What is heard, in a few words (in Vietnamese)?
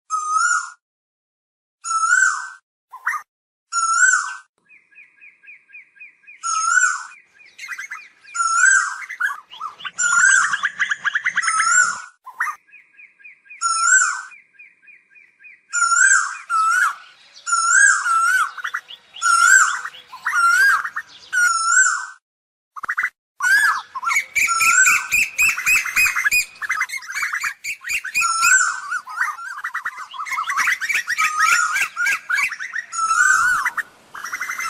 Tieng chim Tu Hu keu